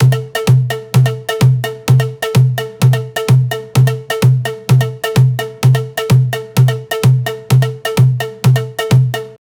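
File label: intense Nepali ethnic drum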